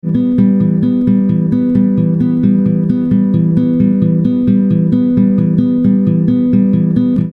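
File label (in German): Lick eingebauter Tonabnehmer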